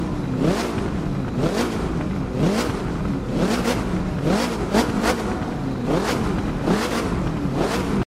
Tunnel blast; Viper

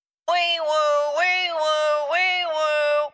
wee woo; Edna

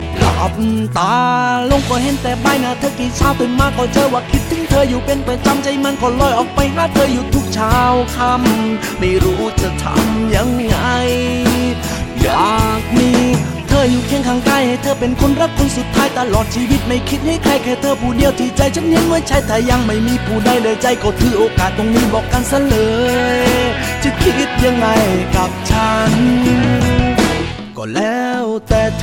Thai Song

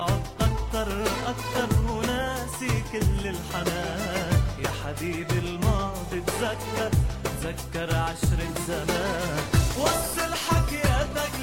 Arab Love

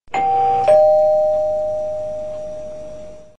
Door Bell

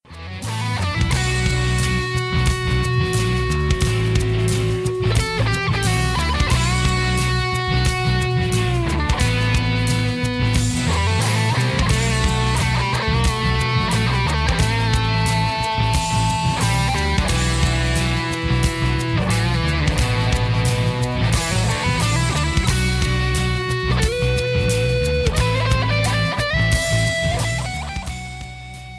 Hard Rock

